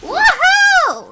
ワハー！

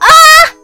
悲鳴

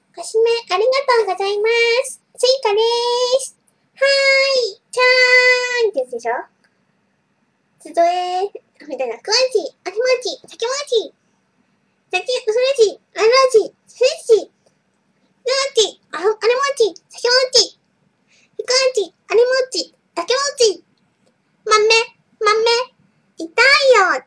アカペラ

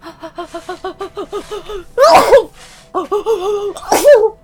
ハッハッハッハッハッハッハッハッハゥハゥ！ハックシュン！（くしゃみ）; 音MAD; Cookie

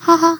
ハハッ

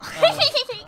笑い

